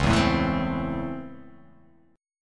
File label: C sharp Minor Major 7th Sharp 5th C sharp, chord